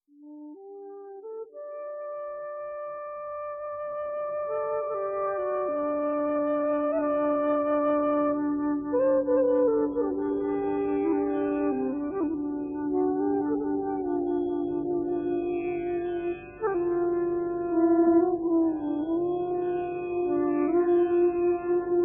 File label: Instrumental